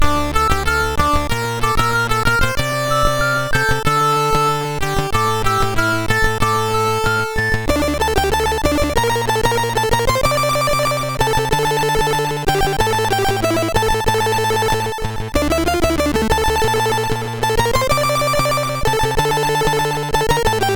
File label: Intro sid MOS6581R3